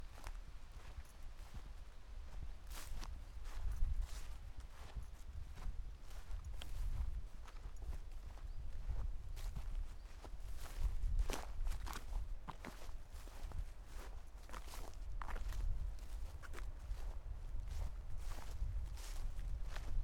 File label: Man Walking Grass With Keys